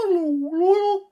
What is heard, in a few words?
Hello bird; SyrinScape; Syrinscape unplugged; Jungleplanet dinolaser battle